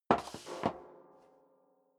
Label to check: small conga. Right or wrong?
Wrong